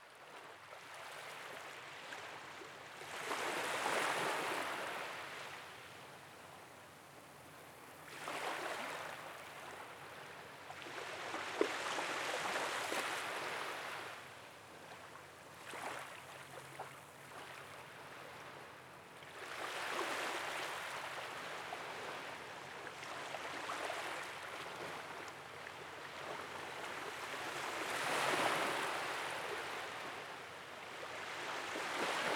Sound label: Beach Loop; UltimateFishing